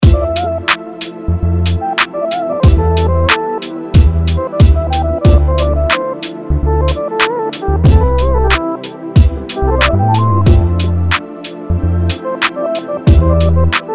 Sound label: solo example